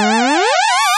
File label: gliss vibrato original; dafx18